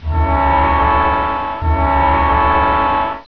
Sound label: train horn